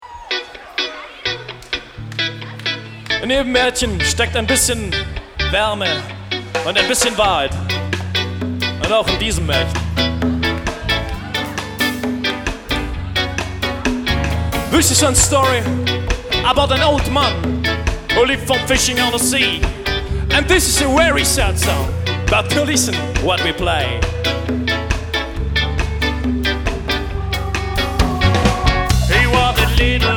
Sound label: Sad Song